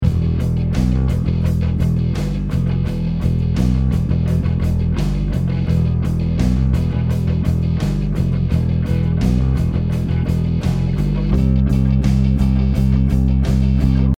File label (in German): Moll Rock Basslicks